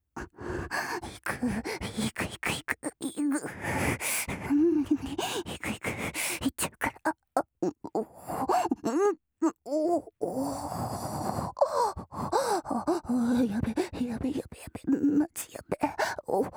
オホ声; リオ

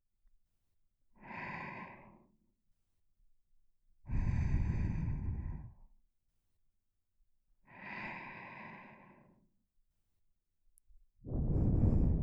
30秒の寝息を30分ループ

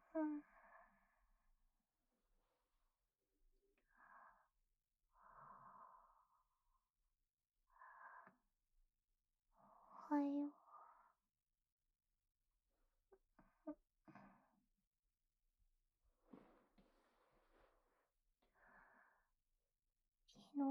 ひたすら耳舐めとキスと; 次の日の朝…; メンヘラ系地雷女子りるかを拾った話。

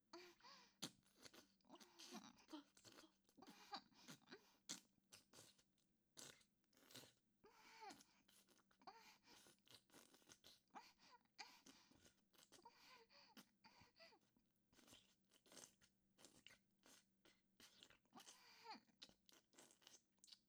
フェラ音(喘ぎ声をあげながら); オホ声; 裏アカド変態女子の初めてのおもらしオナニー

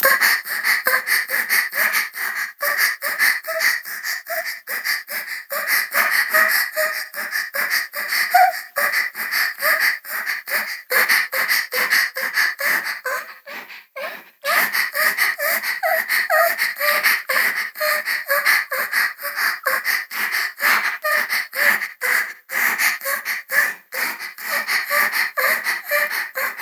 ピストン時の喘ぎ声 高速

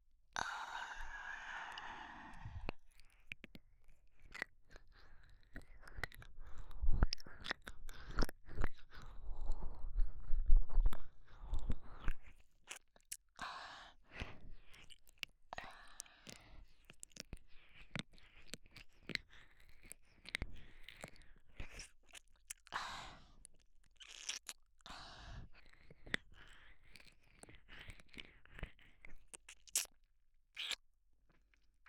耳舐めループ; 耳舐めループ20分

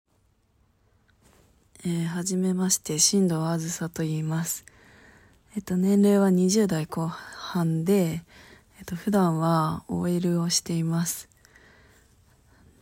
低音ボイスのOLがオホ声オナニーでイキまくる; 期待の大型新人声優がついにデビュー

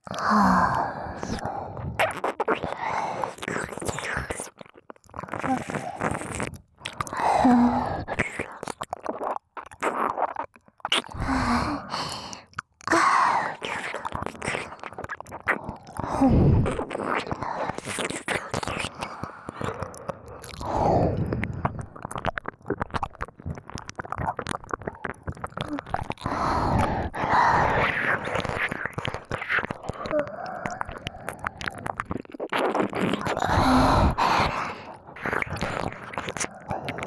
耳舐め, ロリ声, ASMR; ロリ声ぷにマン×オホ声ビッチの濃厚両耳責め～エンドレスな精液搾取にご案内～

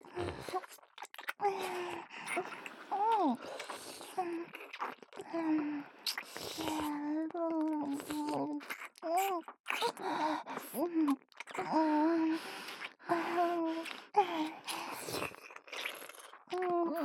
Wディープキス; 小春と美冬

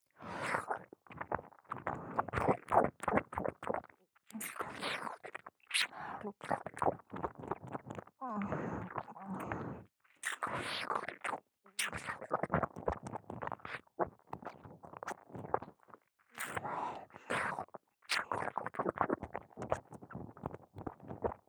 右 耳舐め; ヒルダ; 欲求不満な女軍人たちは堕ちた異世界男娼の耳奥犯して永久搾精したい; ダブル低音オホ痴女

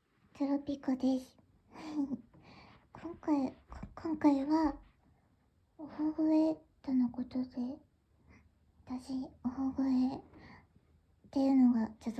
初めてのクリ吸引おもちゃで連続絶頂しながらオホ声初挑戦

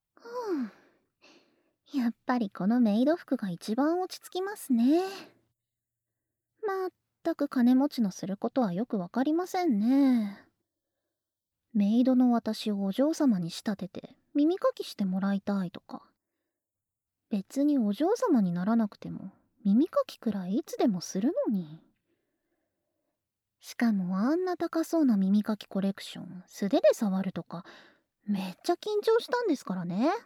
耳舐めメイド, ASMR

